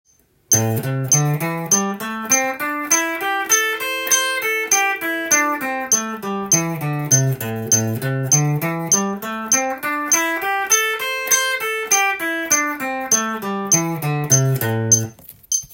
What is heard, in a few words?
easy picking, guitar; easy picking practice tab